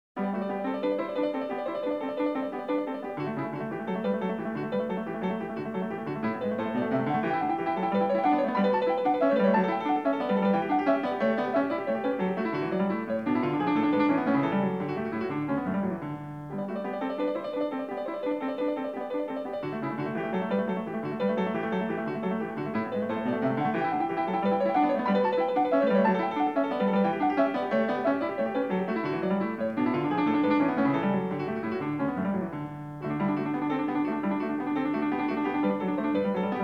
Prelude No. 15 in G major, Bach, Well-Tempered Clavier; BWV884